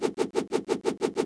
sword swing woosh

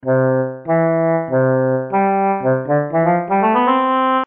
oboe

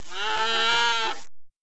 calf

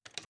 typing

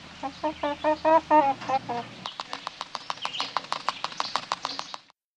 porcupine

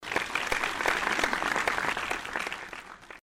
applause

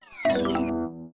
slide drop

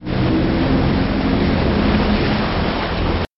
yacht

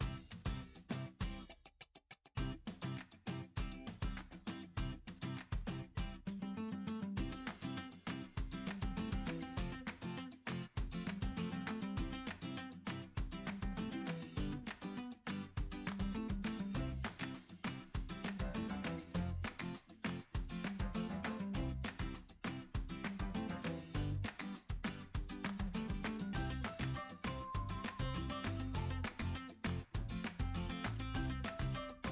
music cheerful; bgm

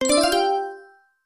right answer effect

